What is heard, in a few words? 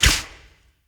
lantern rope cut; Objects; Ode Lantern Crate